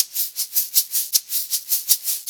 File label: Blues Perc Loop; Sonic Foundry (Sony Creative Software); Loops & Samples Drum Tools